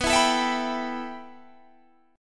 A 7th Suspended 2nd, B

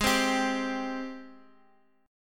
G sharp Major 7th; strummed; chord

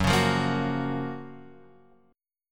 F sharp Major 7th, Guitar, Open F; strummed; JGuitar

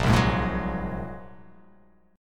A sharp 7th Suspended 2nd Sharp 5th

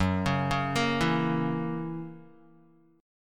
Gb 7th Gb down Guitar; arpeggiated

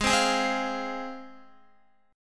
G sharp 6th G sharp down Guitar, chord; G#6; strummed

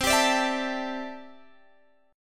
C 6th Add 9th, C, down, Guitar, Open G

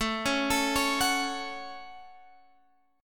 A 6th, guitar

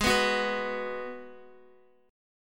Ab Minor 7th down Guitar; Abm7; strummed

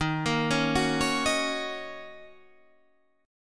Eb Minor 7th Eb Guitar Half Step Down

